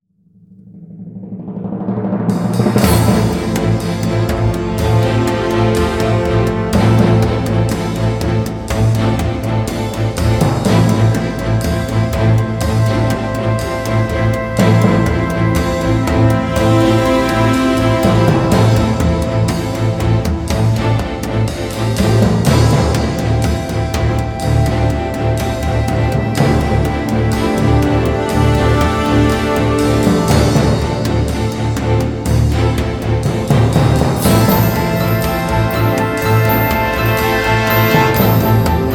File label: Racing Thoughts Instrumental; PM USA